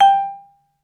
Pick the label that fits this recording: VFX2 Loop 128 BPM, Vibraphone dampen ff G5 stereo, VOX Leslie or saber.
Vibraphone dampen ff G5 stereo